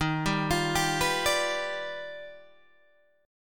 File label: Eb Augmented Major 9th Eb down Guitar Open G